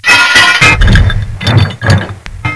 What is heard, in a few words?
die